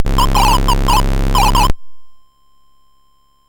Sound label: Dragonworld sid MOS6581R4